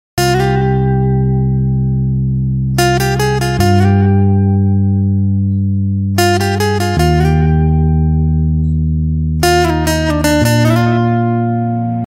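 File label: intro guitar cover instrumental ringtone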